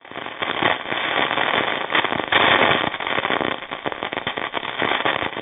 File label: Static noise